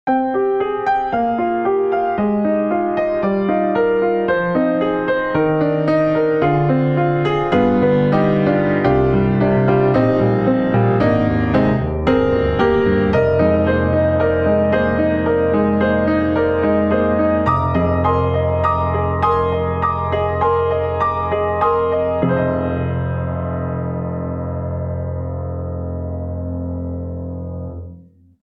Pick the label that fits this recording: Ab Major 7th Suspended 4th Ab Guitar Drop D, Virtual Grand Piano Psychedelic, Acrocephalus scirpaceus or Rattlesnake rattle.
Virtual Grand Piano Psychedelic